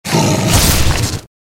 Tiger

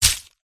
turtle attack bottom contact

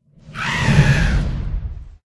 witch attack voice up

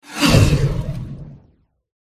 pet dragon buff; drakonchik5

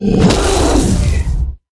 troll attack

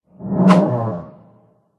murraliy korr attack voice up

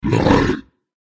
polar bear wound

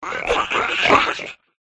iguaron attack voice bottom

